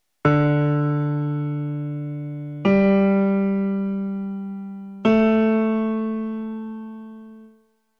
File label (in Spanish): graves re m